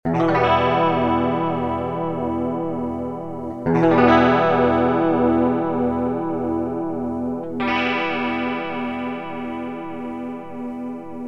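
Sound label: clean electric guitar; clone theory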